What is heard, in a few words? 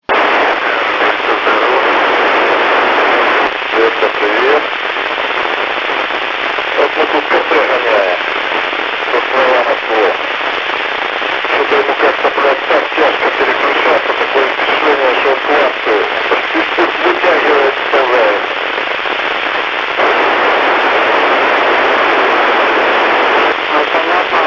milsat